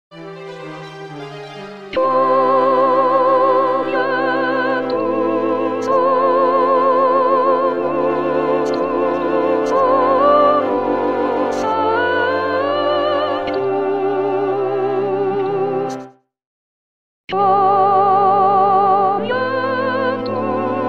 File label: Soprano; Puccini; Messa; Gloria; Quoniam